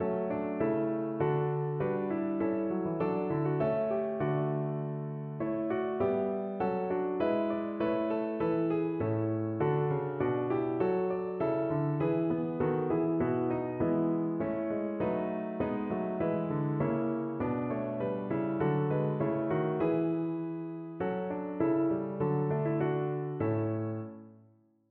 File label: piano